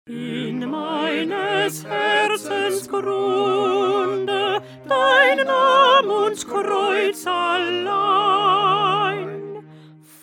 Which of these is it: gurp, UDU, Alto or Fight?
Alto